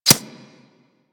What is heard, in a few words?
Arrow Impact Default; Warframe; Weapons